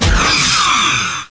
black elm breen torpedo

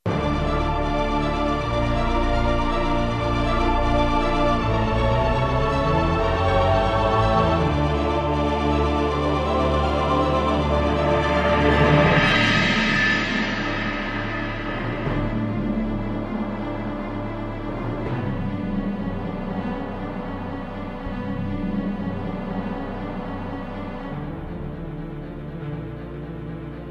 confident

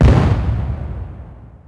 shield; NanoFXv2; ExplosionFX; ExpShield

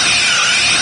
Polaron Beam

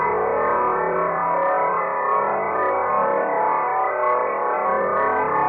Rem Tractor

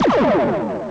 Kazon Torpedo

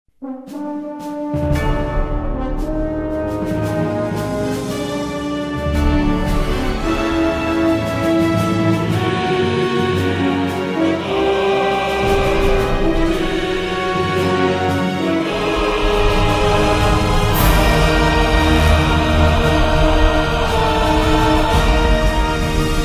Transition

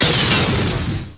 klingon torpedo

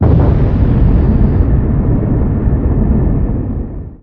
Player Burst, Plasma; SpecialFX; NanoFXv2

